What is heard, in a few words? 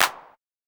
clap